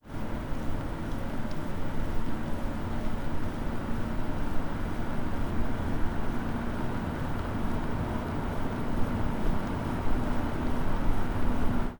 pass By forwards tires, EV1 Tires Only, electric vehicle